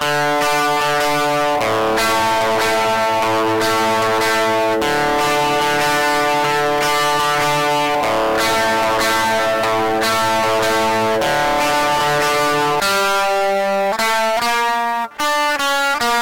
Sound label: g1 rythm ampli